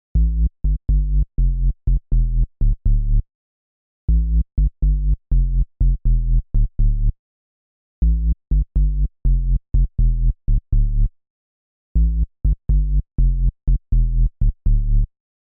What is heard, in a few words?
Bass